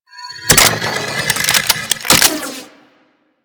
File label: Reload, Weapons; Warframe; Boar Prime